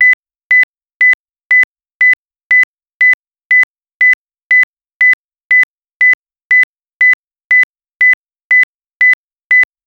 short beep when radio sto

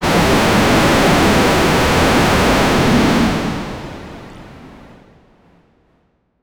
cybernetic wind explosion

